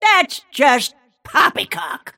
death; snapfire

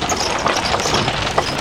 engine move; weapons; dota; creep

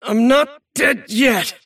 cast pain; davion; dota

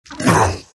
morph pain; terrorblade

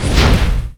attack; dota; pugna

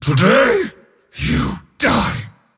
attack pain; dota; mars

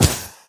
arrow; dota; medusa

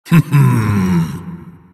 crown grunts; dota; monkey king

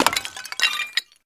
vase break; dota; dungeon

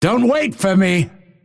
move pain; dota; phantom assassin

